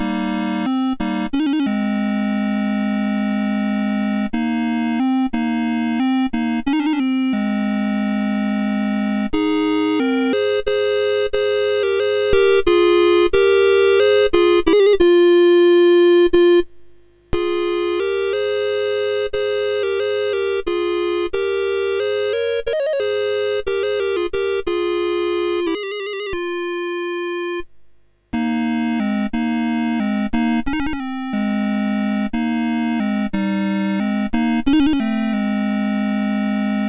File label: Arab Dance, MOS6581R3